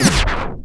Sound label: mix, Production Effects; Best Service - XXL Production Effects